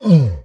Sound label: MONSTER Grunt Hurt; MONSTERS & CREATURES; mono; Universal Sound FX